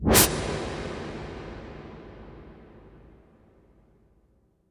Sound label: IMP WIPE, Cinematic Impact